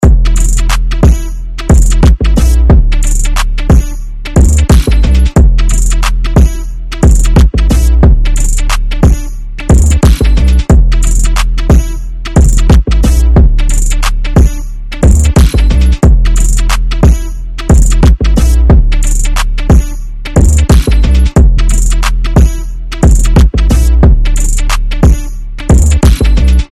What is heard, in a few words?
Trap Ringtone